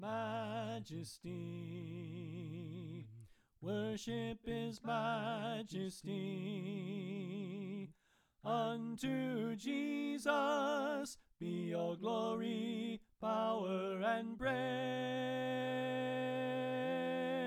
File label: Soprano Left